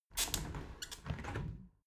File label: window open soft sequence